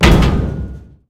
damage hits explosions

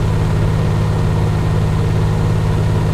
valentine engine rpm 33 load

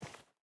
footstep hide dirt walk; Rust

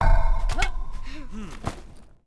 Build Settler; Civ4 warlords

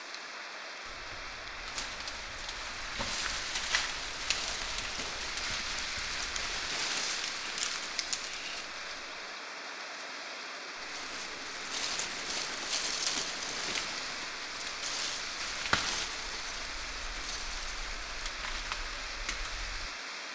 tree leaf rustle

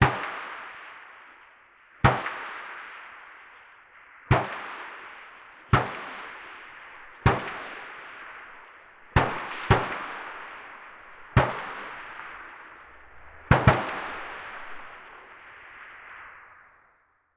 combat atmosphere; World of Tanks

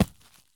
soil sprint; Escape from Tarkov

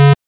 Aircrafts Cockpits Master Warning; DCS

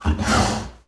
Panther Fort Vox; Civ4 warlords

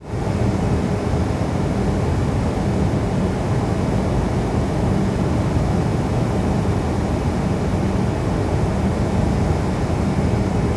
ship engines; World Of Warships

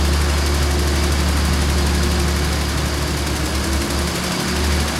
loop exterior engine loop, engines tanks; War Thunder

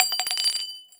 grenade launcher casing bounce

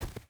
footstep boots snow run; Rust

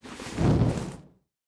torch extinguish; Rust

